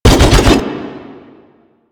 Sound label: Spin Attack Loop; Warframe; Veilbreaker; Sprag